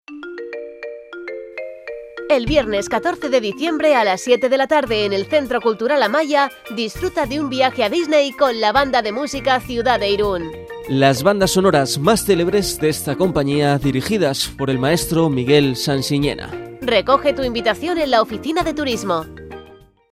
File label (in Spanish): BANDA DE MUSICA; DISNEY